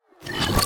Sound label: Wires Power On Rev; Sx Wires Power-On Rev